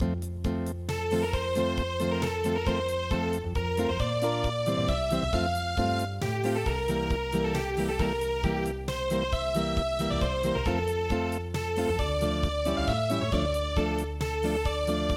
Unplugged, midi

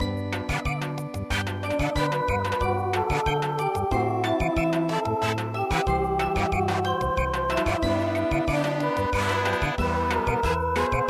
Samba, midi

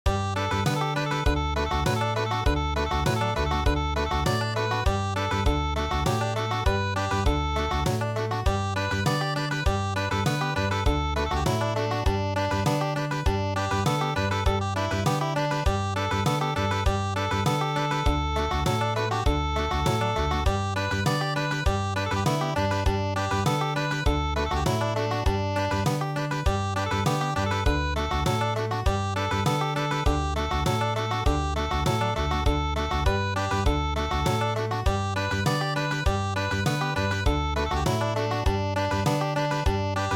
Country

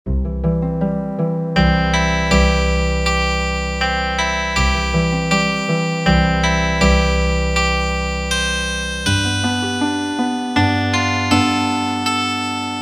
Merry Christmas Healing Harp

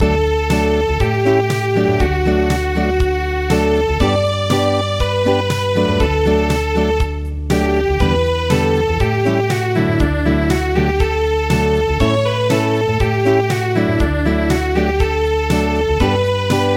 tuta nana tgu Acoustic

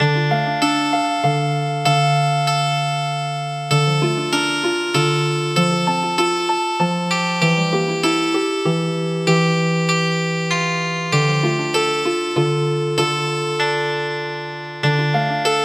Healin Harp

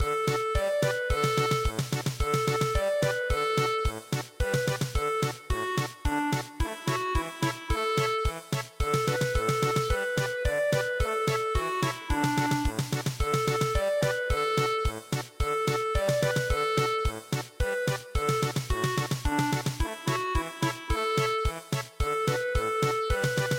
polka, midi; mamemaki

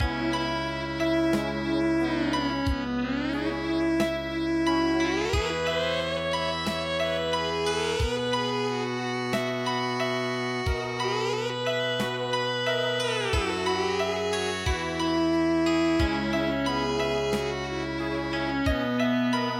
Erhu Music

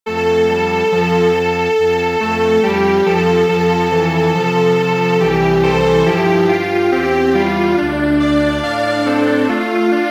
yan yan hakkei Strings Quartet

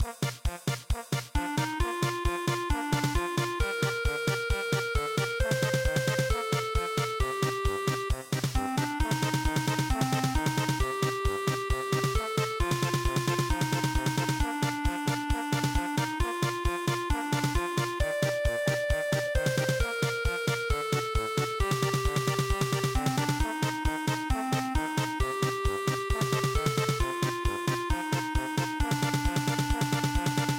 polka; SUMMER EVENING

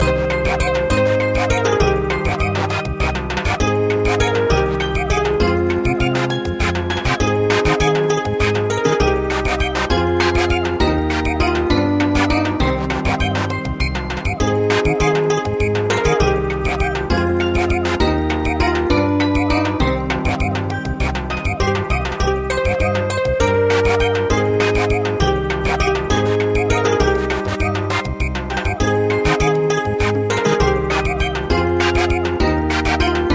Samba, midi

